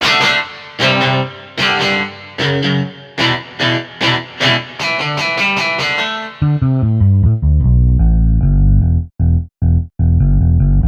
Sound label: midi guitar instruments; eastwest midi guitar instruments test